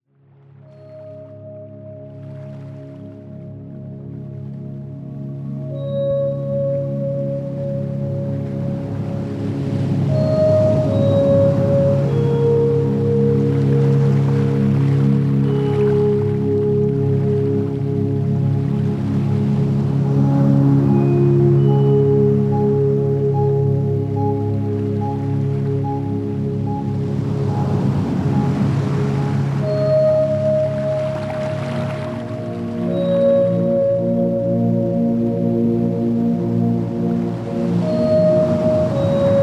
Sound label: Ocean One Meditation